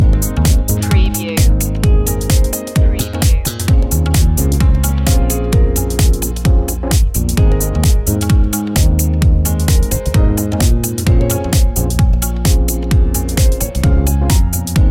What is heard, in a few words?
ethnic night life loop